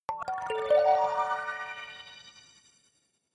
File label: notification kalimba magical sequence major